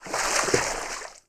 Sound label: FS Water; kult